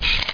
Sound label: down; Light Alloy Ultra-Be